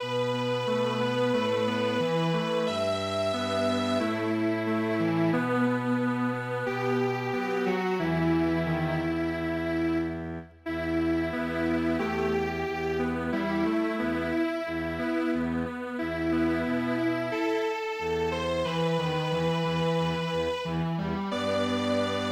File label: Strings Quartet